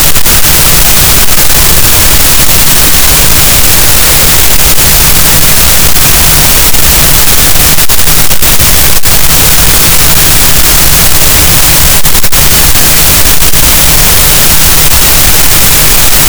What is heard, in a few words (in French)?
cachalot, gaussian noise; Physeter Macrocephalus